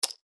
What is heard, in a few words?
place bet, Sicbo